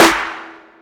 trap acoustic snare sample E key, Royality free snare sample tuned to the E note